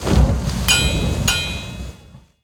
Alley Armorsmith

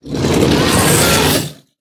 Attack; King Mosh

